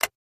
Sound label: Push Button Latch, buttons